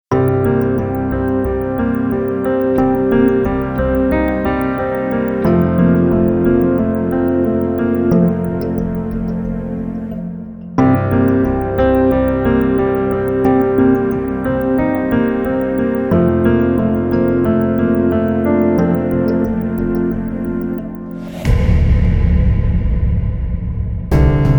Sad Piano; Pandora; Korea

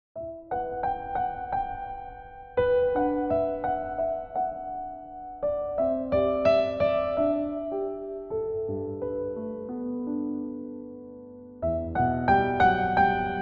Theme Song Variation (Piano); China

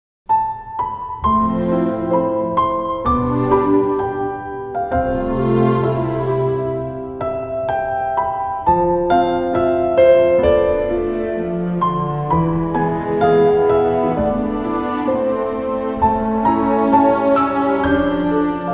Acoustic Ver.; Hotel King; Korea

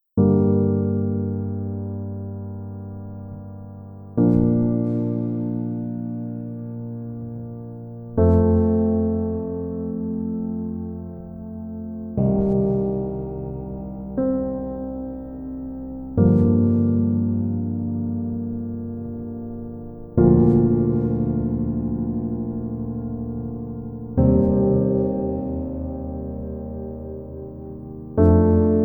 PIANO; Bad Papa; Korea

